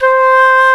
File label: flute C4; E-MU Formula 4000 Series Vol. 5; Protozoa; Proteus2 Presets